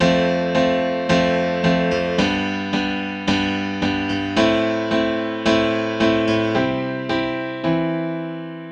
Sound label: Grand Piano 110 BPM (C Major); Climb; Ghosthack; Free Guitar And Piano Samples